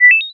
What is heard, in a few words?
question, Interface Sounds; Kenney's Sound Pack